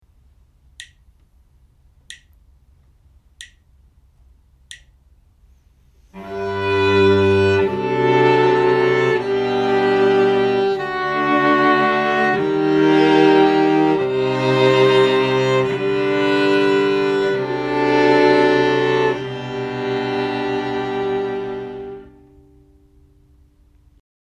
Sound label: harmonic dictation; ear training